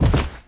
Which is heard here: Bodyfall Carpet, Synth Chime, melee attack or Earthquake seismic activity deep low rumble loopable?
Bodyfall Carpet